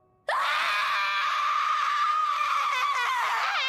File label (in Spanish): grito; Juuzou